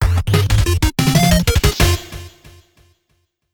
Win; BFDI: Branches

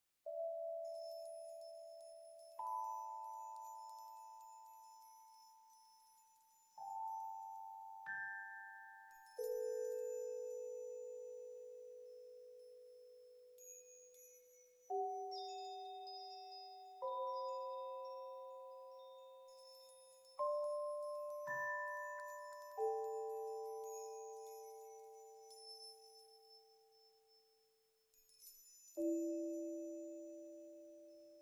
Menu Pad

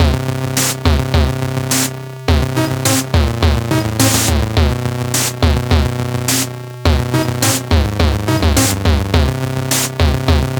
Cave

